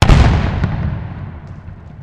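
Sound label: explosion; brainrot; nuclearo dinossaurio; nuclear dinosaur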